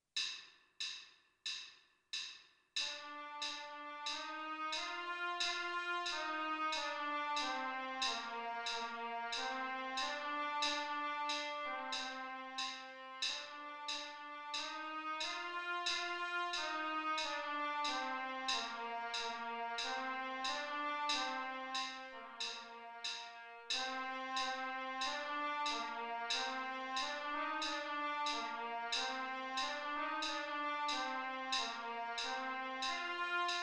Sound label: trumpet